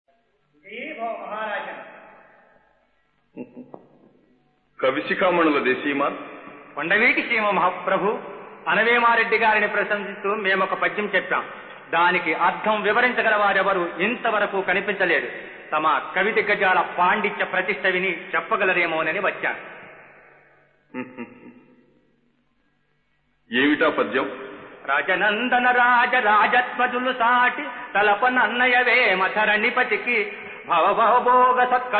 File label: Padyam; TenaliRamakrishna